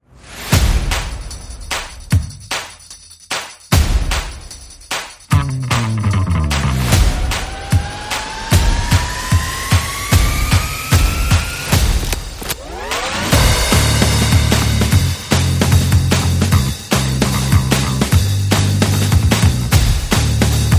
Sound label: Percussion Bass and Effects